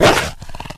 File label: pdog threaten, pseudodog; russian; sven; russian sounds